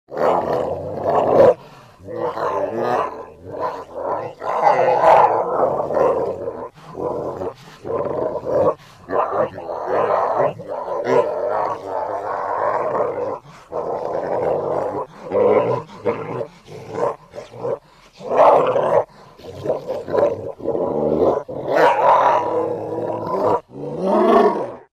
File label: Vicious Dog Growls Processed Low Frequency; Electronic Sci-fi